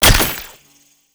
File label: Sniper Mech, Weapons; Warframe; Sentient; Caliban Prime Sniper